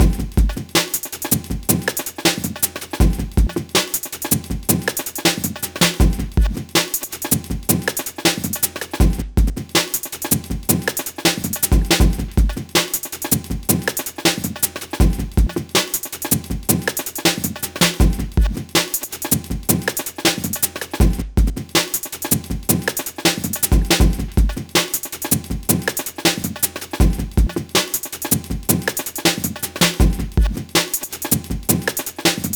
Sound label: drum loop